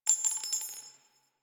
Sniper Shell Casing; DE; Warframe; Frumentarius; Exalted Sniper

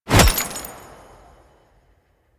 Glass Shank Impact; DE; Warframe

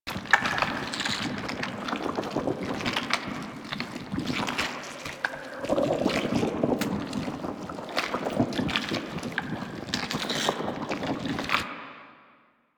Destructable Cyst Loop; Ambience; Props; Warframe; Infested Eris

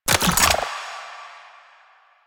Reload, Weapons; Warframe; Acceltra Prime

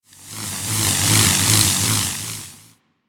Magnetized Random; Warframe

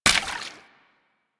Steps Water, footsteps; Warframe; Grineer Royal Jester; Foley

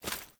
Foley Action Armour Run; Player; Mother Armour

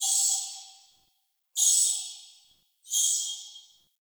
Cave Ocean Creature Far; Ambience; Midrath Island

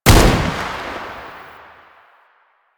AK47 Fire; Warframe; Tenno; Weapons

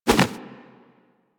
Arm Swing Foley; Enemies; Warframe; Purgatory Warrior

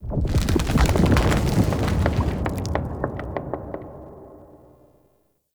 Root Movement, Root Cave; Ambience; Neathuns Root Cave; One Offs

